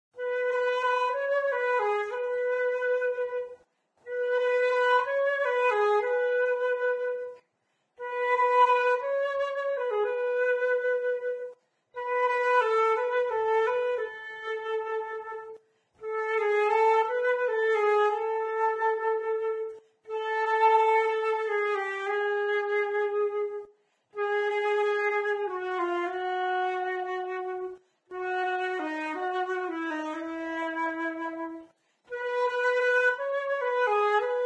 Flute; Instruments; Zomboid; Lifestyle

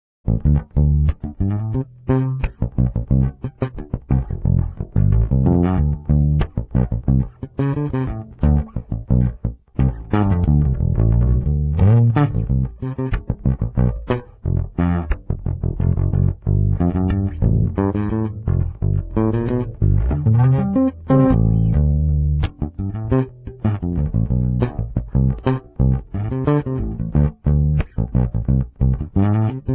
Guitar Electric Bass; Zomboid; Lifestyle

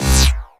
Keytar Failstate; Instruments

